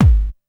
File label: kick dance, techno trance drums